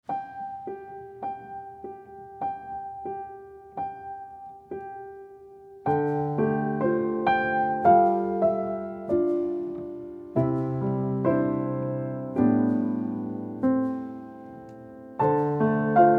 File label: piano